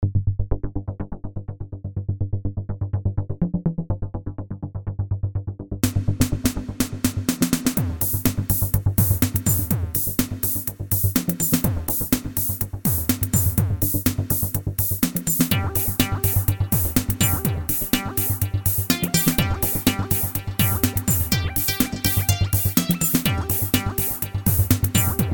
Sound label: jazzy